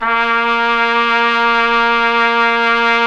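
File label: Solo Brass trumpet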